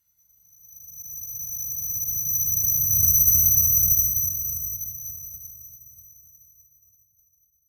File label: Ring High Harsh Passing Tones Like Ear Ringing - Bowed Chime; Hollywood Edge; sound designer's toolkit v2